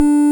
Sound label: Beep; Bitkits; Blip & Blop